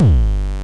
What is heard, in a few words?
FM Kicks Distortion G1; Drums; LookIMadeAThing Kicks - Vermona Kick Lancet